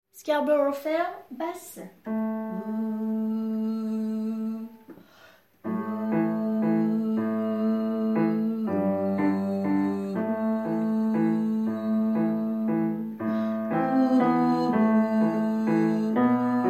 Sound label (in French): basse